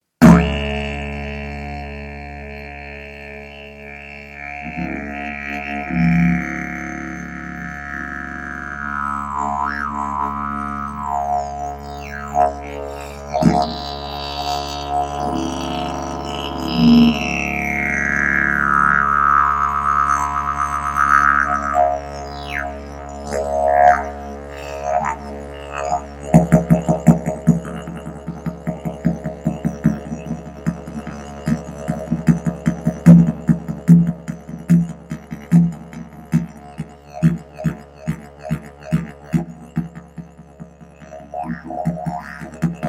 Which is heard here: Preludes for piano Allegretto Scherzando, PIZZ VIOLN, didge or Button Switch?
didge